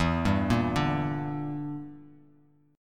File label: E Minor 7th Flat 5th, Bass Standard, Em7b5 chord; arpeggiated